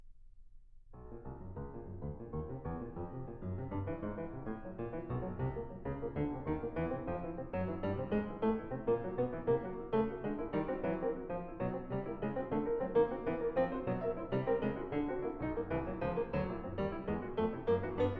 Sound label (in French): etudes pour piano; l'escalier du diable